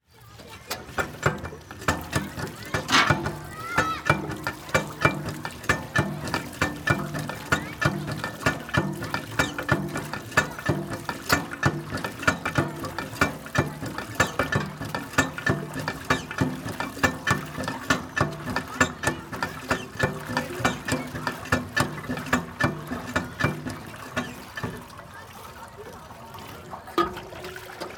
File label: pump waterplayground